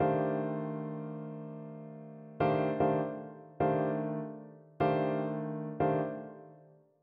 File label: jazz comping techniques, piano